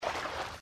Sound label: swim; Casanova